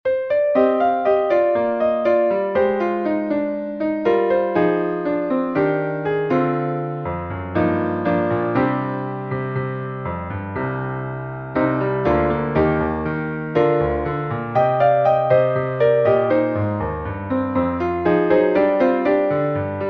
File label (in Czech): klavír; Z tvé ruky, Pane můj